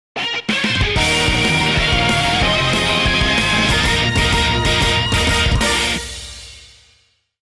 Tema de victoria; Xenoblade; SSBU

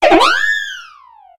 Grito de Whimsicott

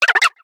Grito de Panpour

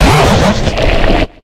Grito de Tangrowth

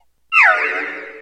Grito de Swirlix

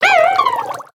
Grito de Frillish

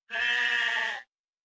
Grito de Dubwool

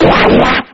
Grito de Golduck

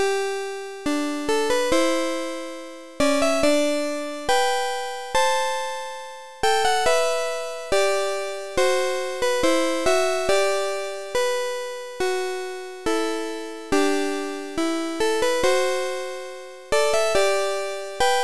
Isla Canela lector GB; HGSS

